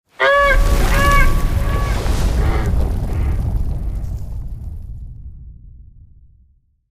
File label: Swan Head Retract; Dream; Village